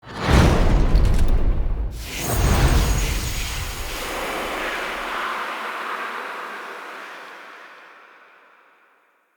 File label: Death Burst; Enemy; Ashen Spirit